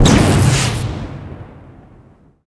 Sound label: ion exp, weapons; quake2